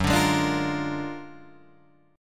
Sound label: Gb Augmented Major 9th, down, Guitar, Open D